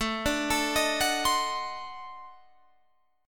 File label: D 7th Flat 9th A, chord